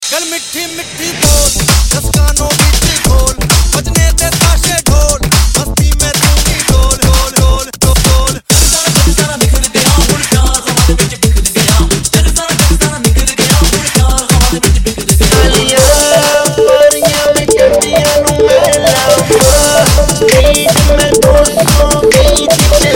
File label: Mix Dj 3 In 1